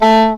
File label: Horns